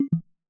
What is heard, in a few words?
walk; ゆめ2っき